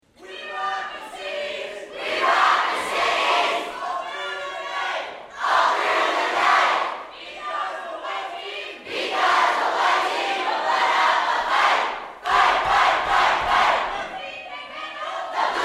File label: Cheer